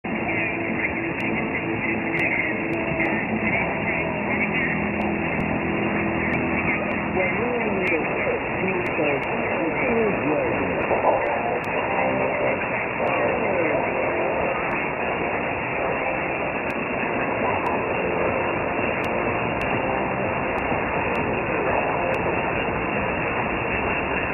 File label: Absolute Radio